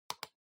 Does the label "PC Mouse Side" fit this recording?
Yes